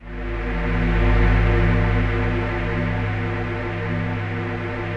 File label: DEEP PAD G1 L, Yamaha VP-1; Music Store